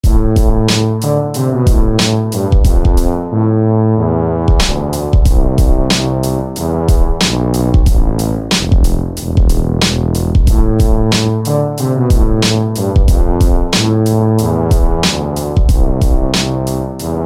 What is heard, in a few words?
Tuba; SFB FX